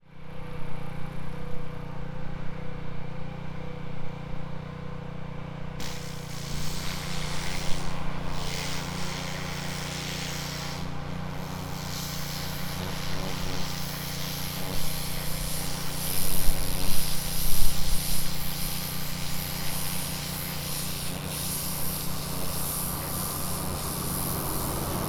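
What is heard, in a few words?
main entrance, Fukushima Soundscape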